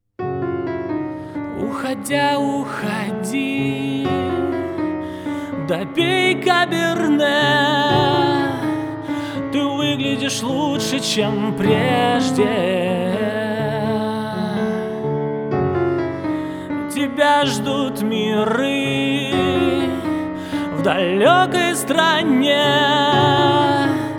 fortepiano version

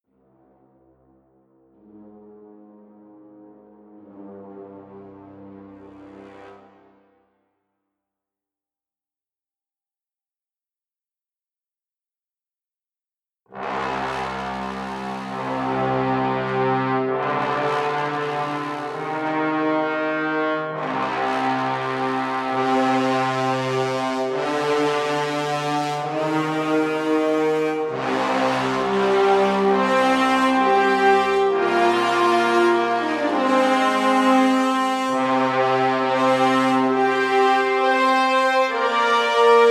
brass stem

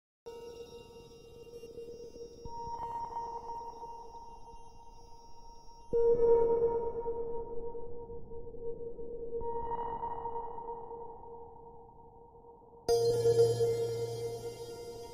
bells

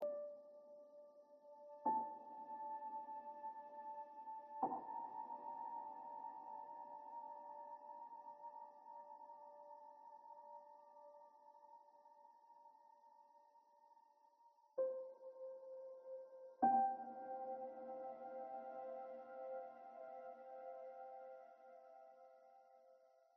ambient

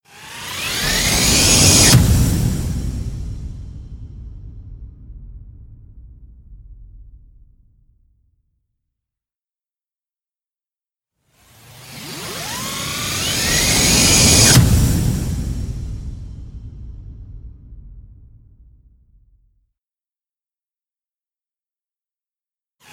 whooshes machine scream

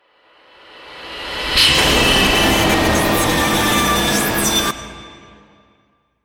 tonal impacts disturbed

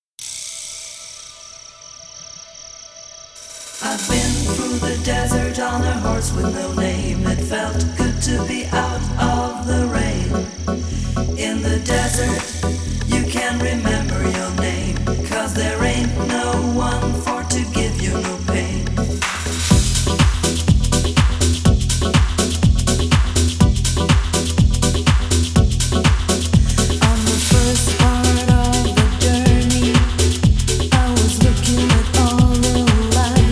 club mix 123 bpm

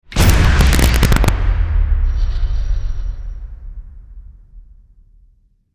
double tap dive

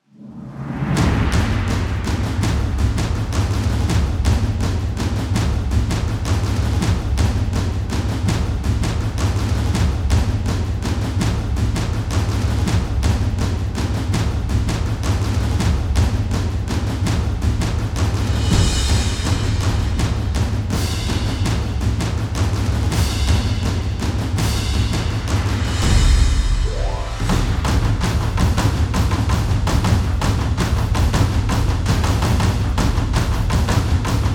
drums only

